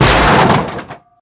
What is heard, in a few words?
bump hard, car